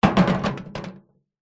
metal small container bin empty knock over or drop on concrete; zapsplat